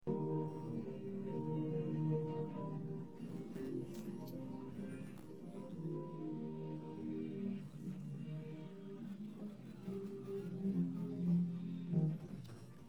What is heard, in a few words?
no vocals